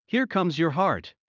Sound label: Heart mid; Classroom humanbody